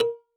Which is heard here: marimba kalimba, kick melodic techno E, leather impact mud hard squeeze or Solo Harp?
marimba kalimba